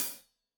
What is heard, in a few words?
Hat Loose, Hi-Hat, Cymbals Stereo